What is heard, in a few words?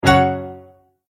multimedia tone exclamation; McKinney Sound